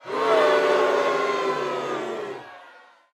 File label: game lose; Casualino; Spades